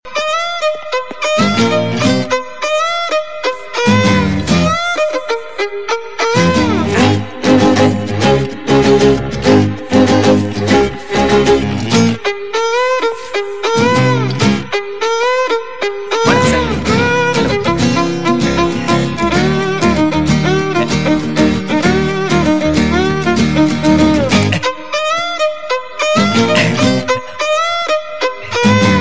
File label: Volin